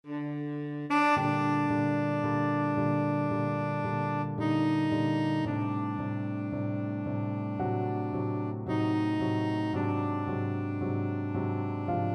tenor saxophone

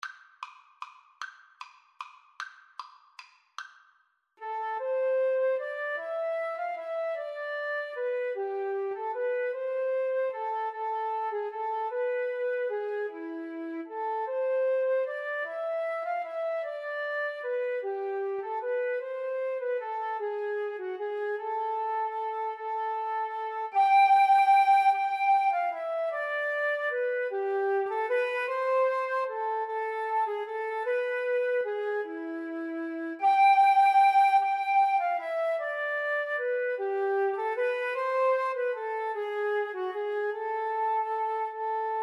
greensleeves, Flute Clarinet Duet

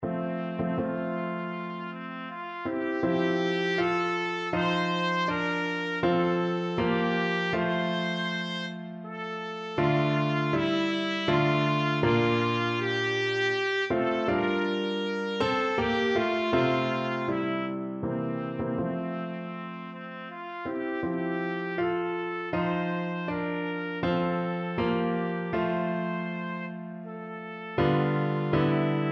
mendelssohn nocturne, trumpet

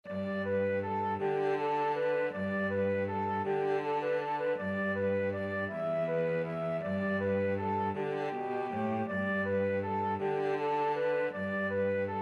flute cello duet

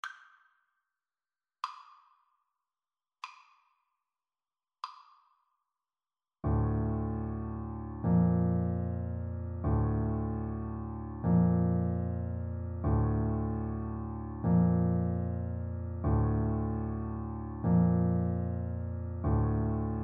chopin funeral march, Bassoon

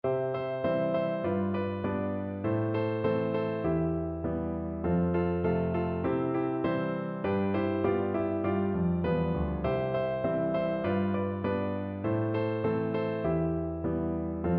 2 piano; old st nic

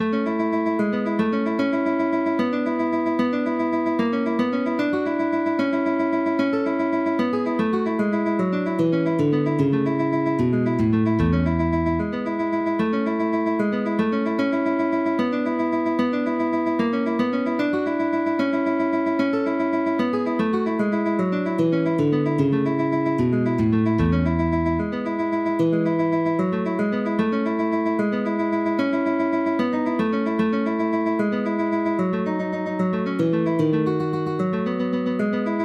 etude, guitar; carcassi; op60

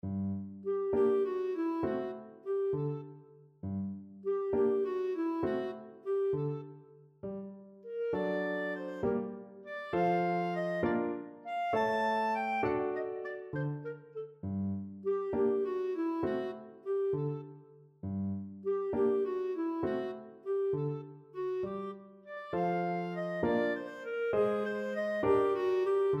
marionette, clarinet

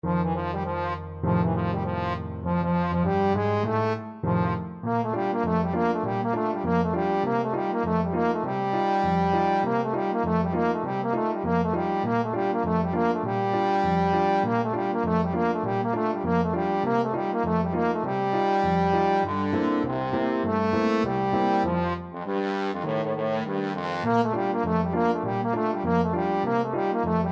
trombone

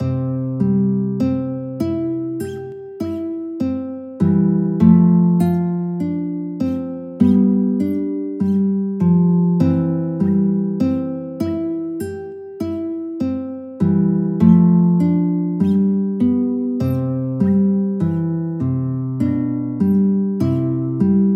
study in c, guitar; sor

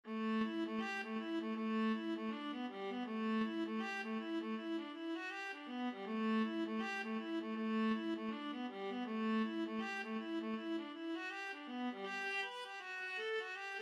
viola

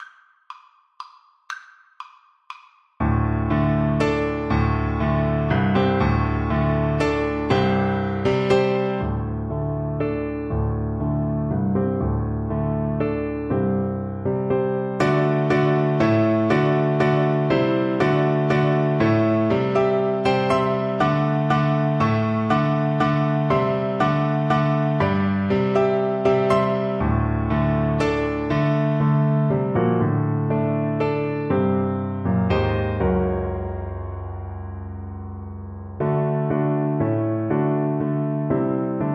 blast of wind violin

